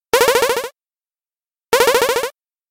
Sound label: coolest whatsapp msg